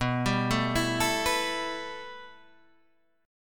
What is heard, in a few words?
B 7th Suspended 4th Sharp 5th, Guitar, Half Step Down; B7sus4#5